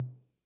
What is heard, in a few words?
Tom Mid Min Decay, 808